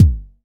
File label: warm kick sound a sharp key; kick drum samples